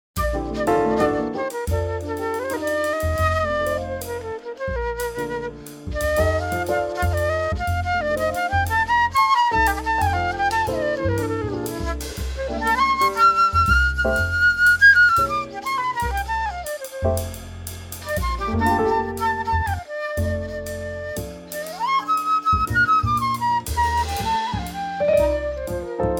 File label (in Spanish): impro melodico